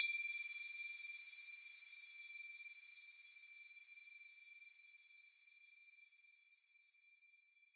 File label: ting; opsis